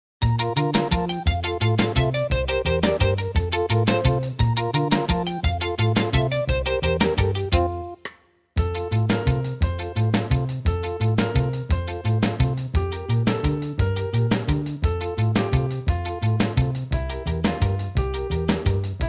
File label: Instrumental